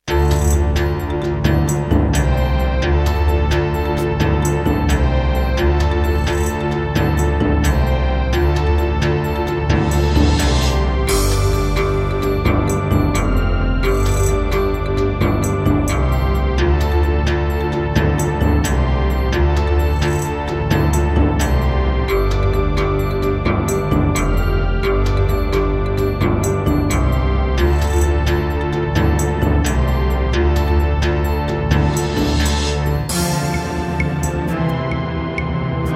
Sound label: Serdaco Dreamblaster X1, wavetable; Heretic